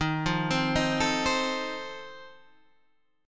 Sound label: F 7th Eb